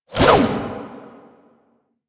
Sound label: Jump Whoosh; Enemies; Foley; Generic Foley; Warframe; Duviri; Dax